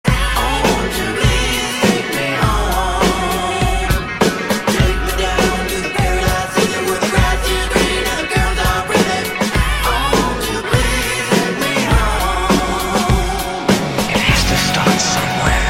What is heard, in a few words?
Rock Remix